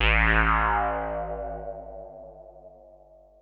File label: SPACE SYNTH 140 A; SpaceSounds